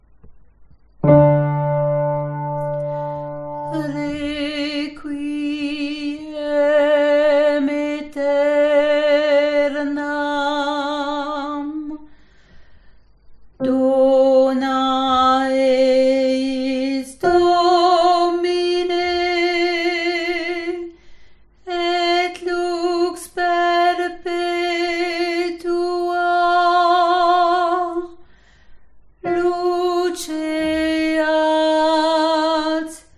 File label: Introit Alto